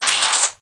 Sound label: hagar load, weapons